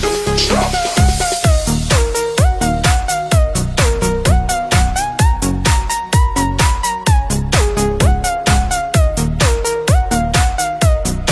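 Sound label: ringtone remix instrumental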